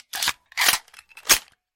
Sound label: pistol reload